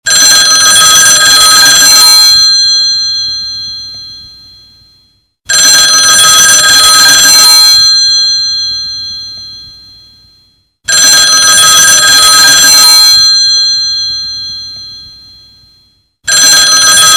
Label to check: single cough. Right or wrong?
Wrong